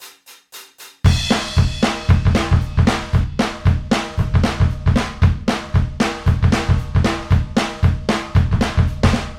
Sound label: drum hane